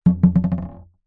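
leisure ball rubber gentle bounces on hollow wood surface boomy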